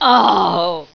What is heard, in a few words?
female death